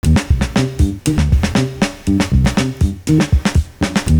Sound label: duuubb; Univers Sons